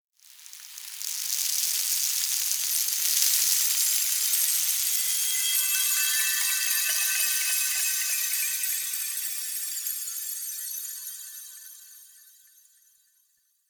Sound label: Sand Pour Chimes; Narmer Outpost; Ballas; Throne Room